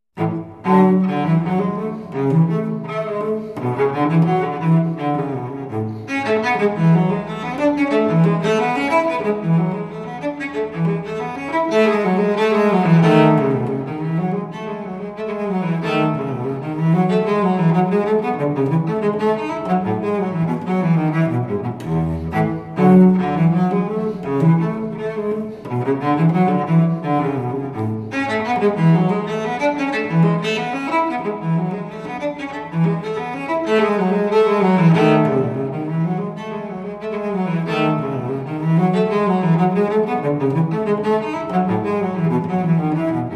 Partita Terza in La maggiore bouree, violoncello; Bach; Sonate e partite per violino solo eseguite sul violoncello

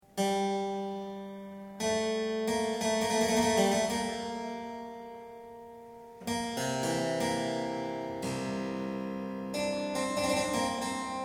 Link, Dark Harpsichord Music

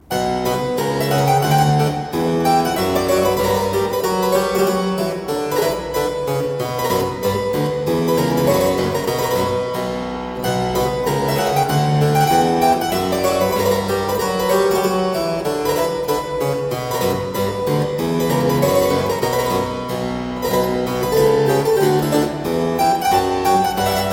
Suite in C Minor - Aire, Keyboard Music, spoken; William Croft

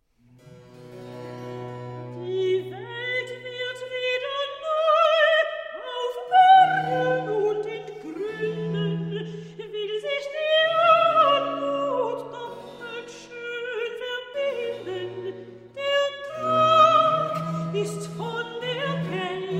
Recitative; Weichet nur betrubte Schatten; Bach; Cantate

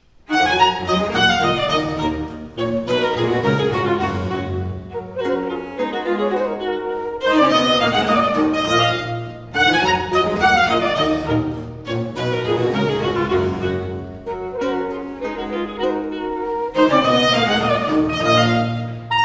Vif; Suite from Les Fetes D'Hebe; Rameau

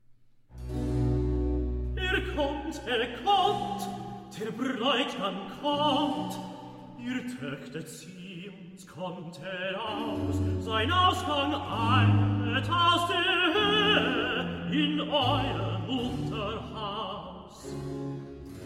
Recitative; Er kommt er kommt; Bach

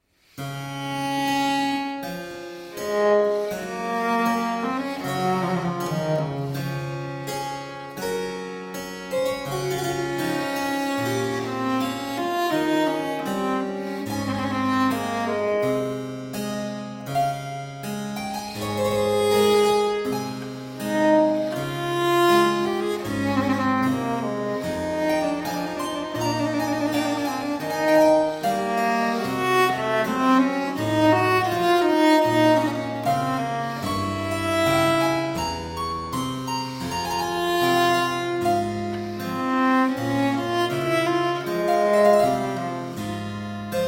Sonata in D major Adagio, viola da gamba; BWV 1028; Bach; Sonate per viola da gamba

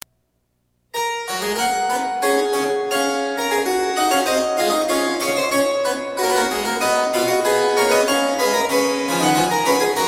Variatio 18 Canone alla Sexta a 1 Clav; Bach Goldberg Variations; Johann Sebastian Bach

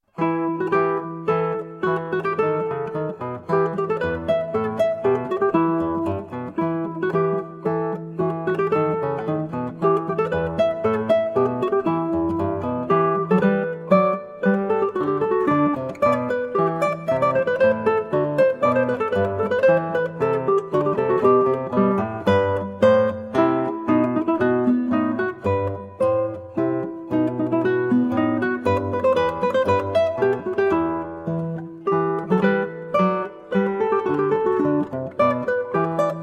Polonaise, Guitar and Ukulele; BWV Anhang 119; JS Bach

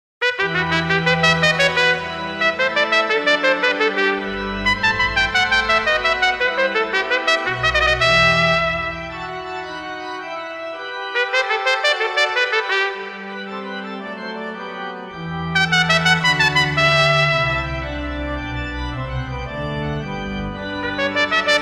Giga Sonate in E minor, Horn and Organ; Arcangelo Corelli

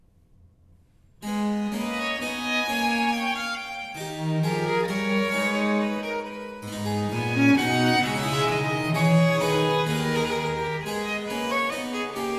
Sonata V in a minor Vivace; Geminiani; Haendel - Musica sull'acqua

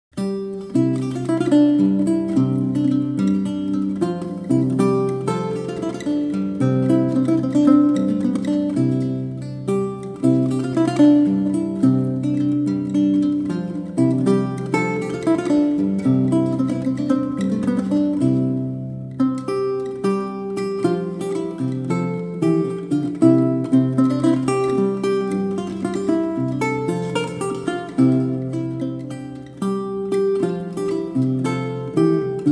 Branle Poictou, Lute; Pierre Attaingnant

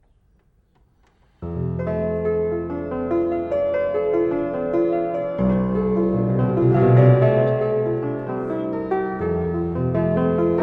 Preludes Op 28 - No 23 in f major spoken, Piano; Frederic Chopin

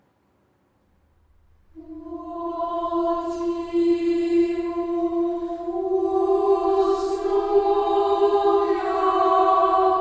Childrens Choir; Having slept in the flesh as a mortal; St. Eliyah Childrens Choir; We are Guarded by the Cross

